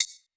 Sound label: hihat roomy; Polyend; Recue